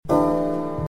Chord Solo

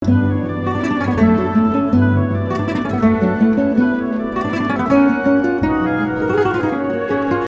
Fast Lick

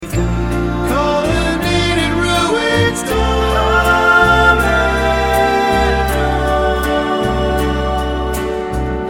Harmonies

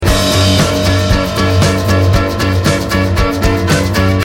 F sharp min7

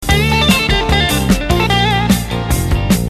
Fast Lick

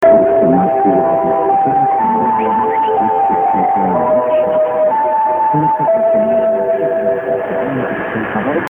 Interval Signal; Radio Moscow

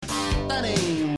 Synclavier

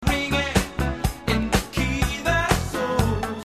Bass Snare

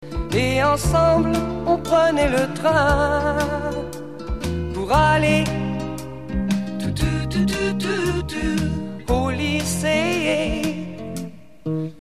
Modulation

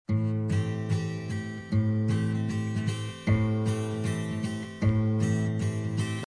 Amin7

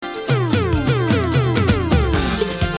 Percus Synth

